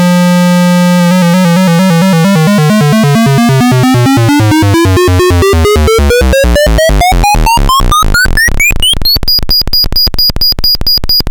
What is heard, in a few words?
vco freq mod from lfo sqr wave, analog synth; analog synth 101